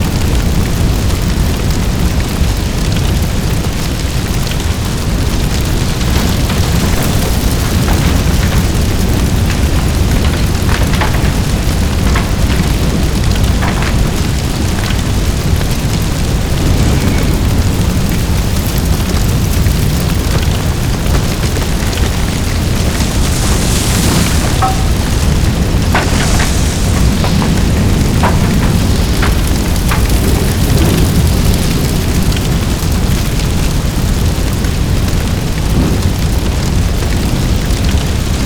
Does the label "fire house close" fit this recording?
Yes